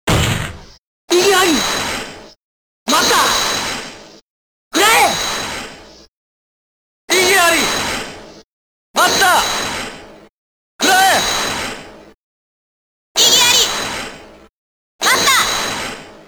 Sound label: Sound Effects - Character Version